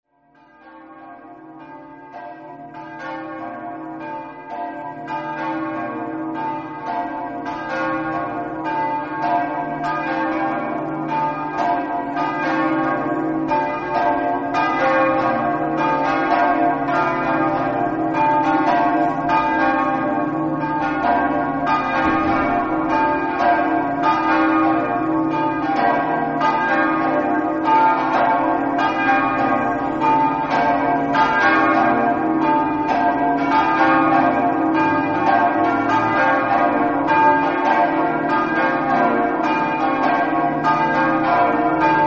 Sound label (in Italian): carmine, campane